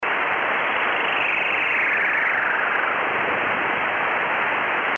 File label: interference